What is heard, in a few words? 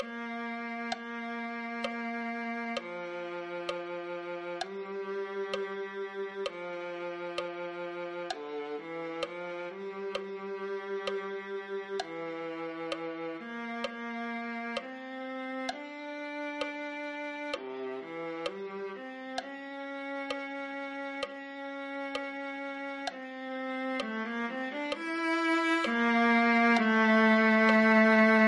viola